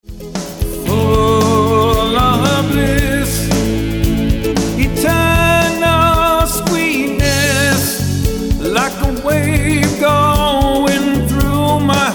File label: Bubble of Laughter